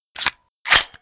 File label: desert eagle reload; wartso